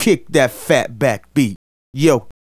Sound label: Fat Back Beat, Vocal Toolbox, Sound Bites; Sonic Foundry (Sony Creative Software)